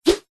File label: sport tennis racket swing swoosh whoosh air; zapsplat